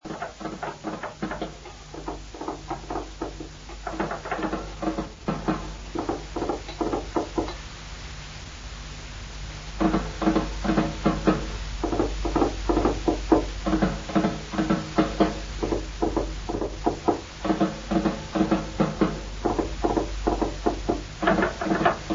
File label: drum talk; Die Sessions